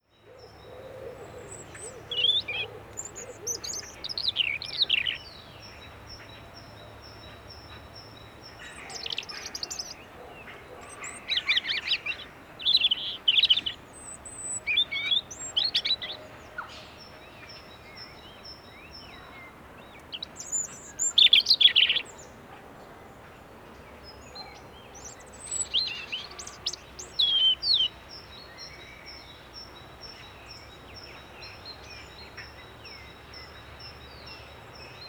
Erithacus rubecula; SETE

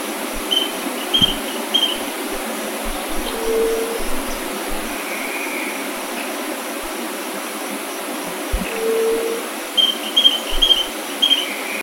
bird

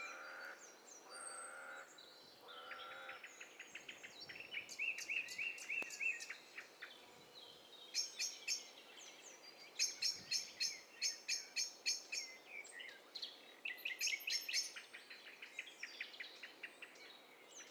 STEREO BIRD COCKTAIL PARTY

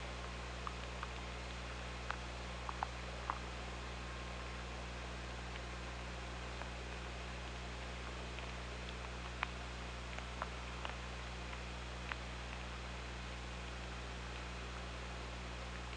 OrcaLab

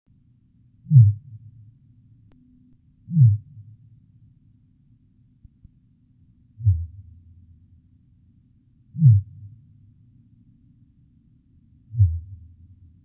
Fin, Voices in the sea

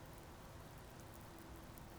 pulses, BALAENOPTERA; MONACO 2022-07

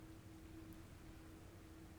pulses, BALAENOPTERA; MONACO 2022-07

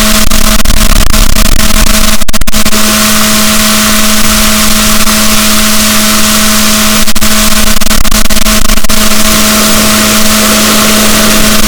rorquals negatif, boat

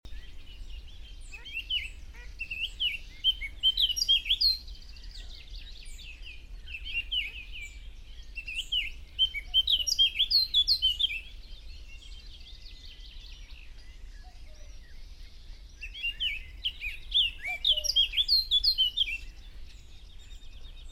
Sylvia atricapilla; SETE

